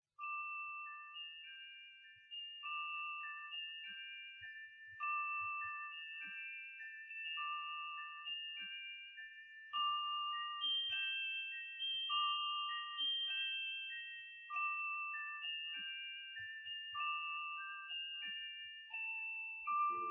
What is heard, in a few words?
Carillon (with music clock)